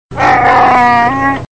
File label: chewbacca roar; star wars